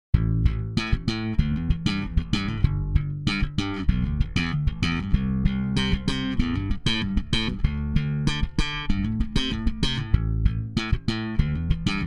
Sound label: Bass; ART SCL2